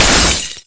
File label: Hit Heavy Ice; netent